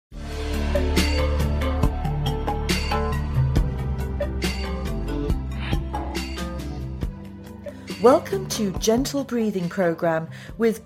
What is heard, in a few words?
Asthmatic Breathing